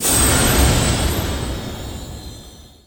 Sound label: Pickup Magic; SFX Kit; Pickups